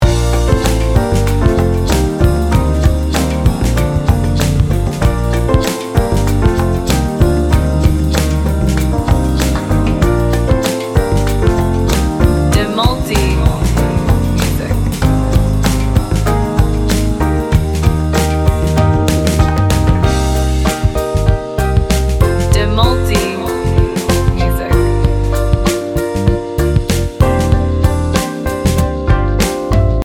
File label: instrumental pop; Lagu ini memberi dorongan motivasi